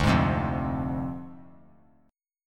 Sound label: D Minor 7th Flat 5th; Dm7b5; strummed